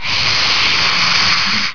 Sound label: Hiss